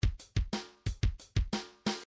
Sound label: shuffle 1 bar, midi